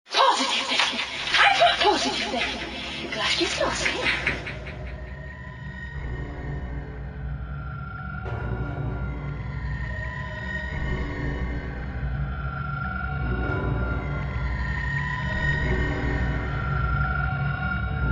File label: Scream